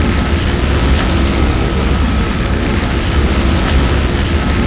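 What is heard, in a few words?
boss hover engine; lmctf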